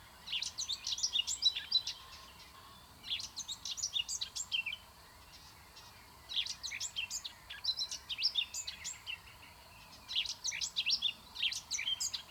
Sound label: canto verso sterpazzola sylvia communis common whitethroat; Canto territoriale; curruca zarcera; bioacustica